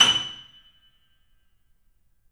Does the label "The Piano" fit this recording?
Yes